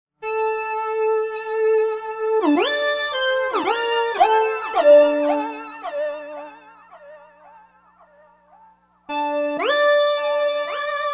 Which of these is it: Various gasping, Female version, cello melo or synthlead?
synthlead